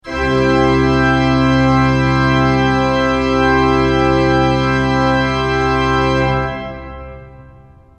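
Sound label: tutti I + II, organ; srednja vas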